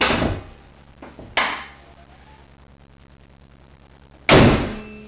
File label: hood, cars; transpo